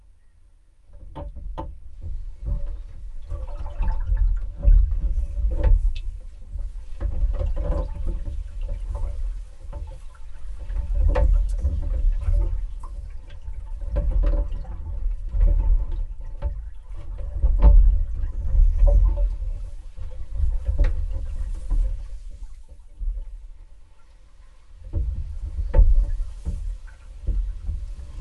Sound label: boat plastic foley using paddles swimming interior; stereo ab; fvtt sonniss gdc audio